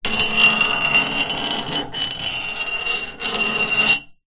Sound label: metal scrape object along metal pole; foley